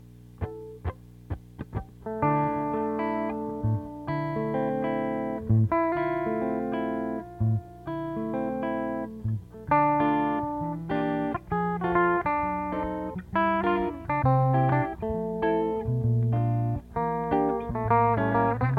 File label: jazzy Thing